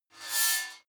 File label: metal squeal bright fast; metall